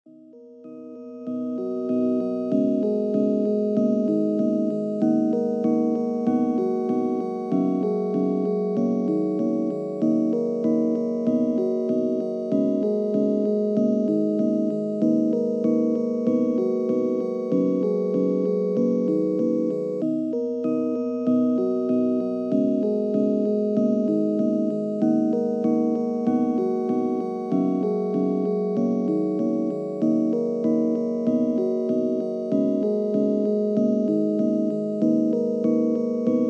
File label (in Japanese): 海のオルゴール